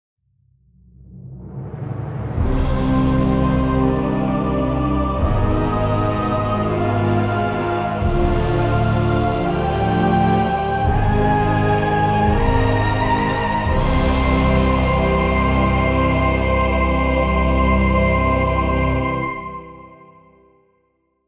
Jingle Lighthouse Destruction

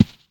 footstep

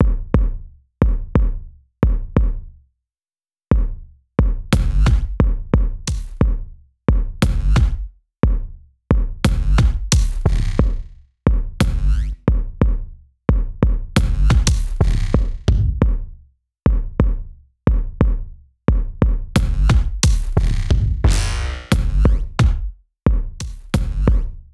BGM Low; Happy Birthdays

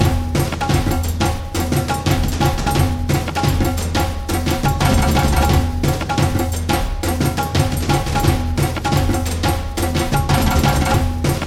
bgm desert battle; world desert; desert battle normal tsunagi

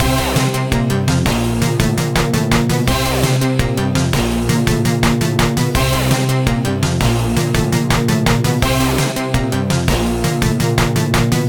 BGM ramen

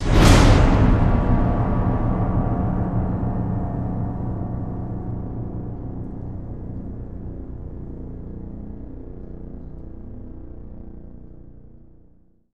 END PERC; transformers war for cybertron gamerip

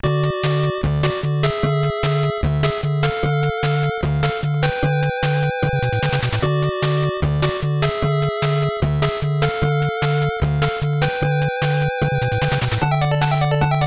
Total Victory; Toukon Club; NES

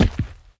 Footsteps; unreal development kit

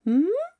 alert

